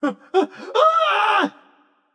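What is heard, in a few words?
nonverbal fear; smite; voicepack; merlin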